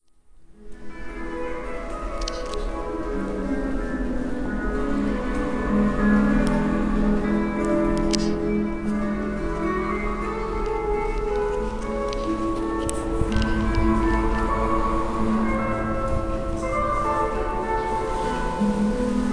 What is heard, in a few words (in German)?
u bahn